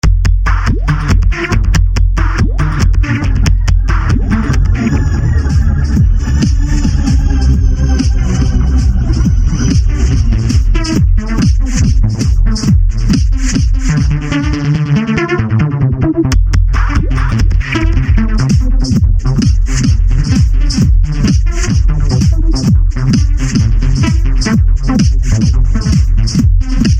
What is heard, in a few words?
new trance effect